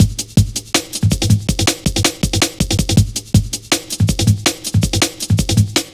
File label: Drum Loops, Jungle; 161.5; Zero-G Jungle Warfare 1, 2 & 3; Skippy B; 90 s Sample CDs